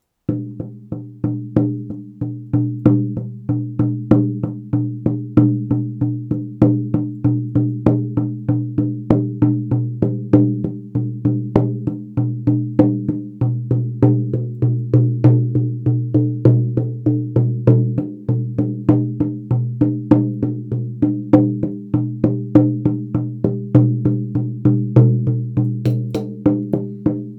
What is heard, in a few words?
Fallow deer Earth Song, drum; ros-simons